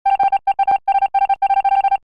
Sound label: All Vote Out Typing; Among Us